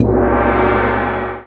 Chinese Cym gong Lite; GMPercussion; 3DO; Toolkit 1.5; GMPercussion22k

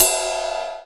Ride Cymbal Long; GMPercussion; 3DO; Toolkit 1.5; GMPercussion44k

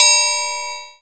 Triangle Open; GMPercussion; 3DO; Toolkit 1.5; GMPercussion22k

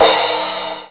Crash Cymbal Short; GMPercussion; 3DO; Toolkit 1.5; GMPercussion22k

